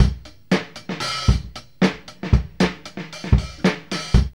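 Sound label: 116 bpm, Funky loops; Zero-G; Funky Elements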